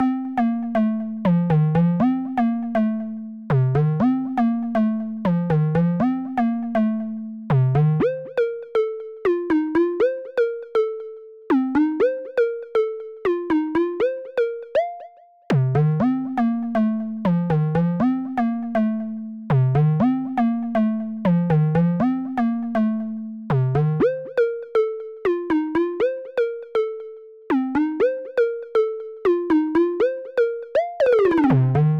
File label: Techno Line